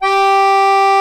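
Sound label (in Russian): аккордеон соль3 g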